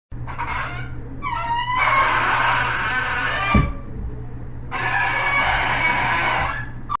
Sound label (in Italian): suono unghia sulla lavagna